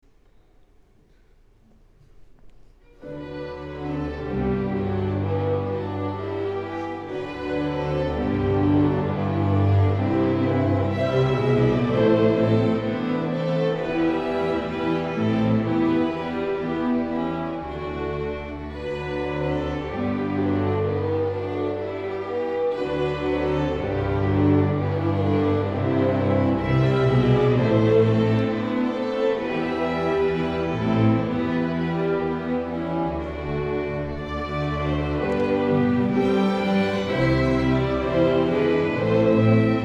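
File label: School Orchestra; Stover School; Parry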